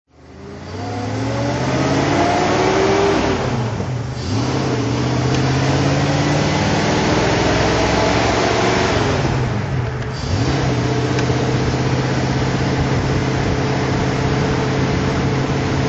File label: speedsix special drive